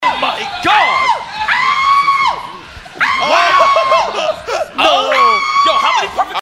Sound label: screams